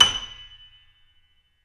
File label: KEY F#6, YC7 Piano mf; Roland L-CD701